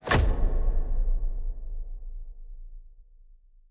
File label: Special Click; Fantasy Interface; Fantasy Sounds Bundle